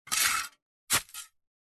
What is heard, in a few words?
digsand